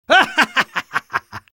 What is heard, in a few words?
spy laugh evil; vo